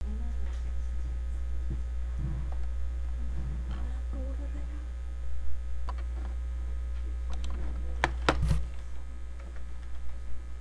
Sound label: elephant